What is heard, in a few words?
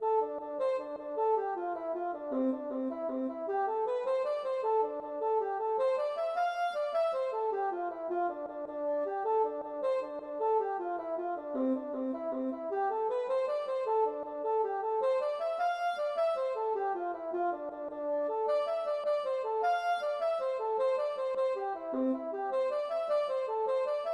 jig